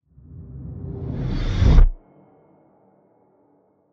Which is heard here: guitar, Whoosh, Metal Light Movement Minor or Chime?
Whoosh